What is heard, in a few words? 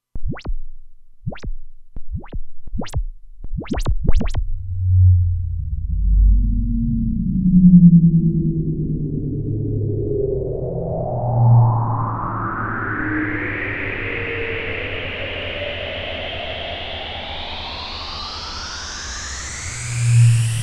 BlastRvrse, Roland Alpha Juno-2; Factory 2